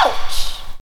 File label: chord, stabs